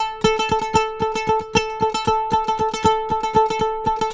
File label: Oud, Arabic; Sonic Foundry (Sony Creative Software); Continental Drift Aftershocks